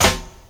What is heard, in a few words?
snare sample e key; snare drum samples; drum single hits one shots